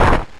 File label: Jump Kick; pokemon reborn e19 2022